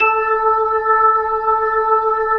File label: B-3 A 4, Jazz; Keyboards of The 60's and 70's